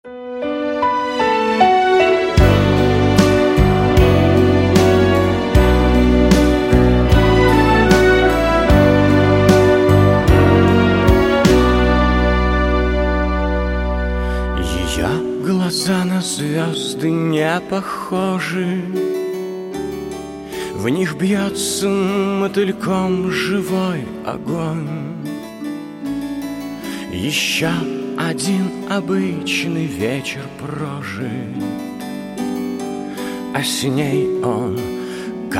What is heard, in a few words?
symphonic orchestra